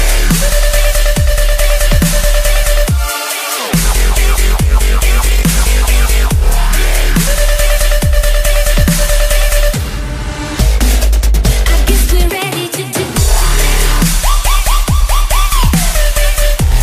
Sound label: Dubstep